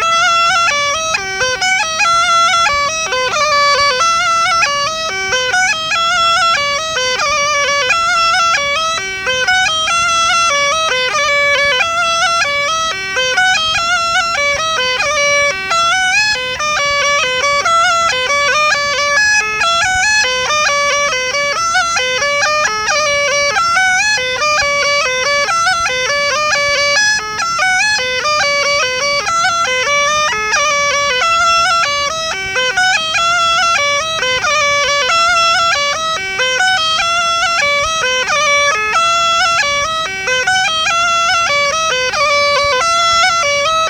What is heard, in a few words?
Varnenski 9 melody minor, Small Gajda